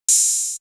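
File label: Hi-hat; 90 sSampleCDs; Coldcut's Kleptomania VOL1; Drum One Shots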